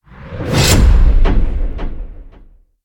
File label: Blowing Metal Punch